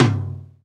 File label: Old Skool House Perc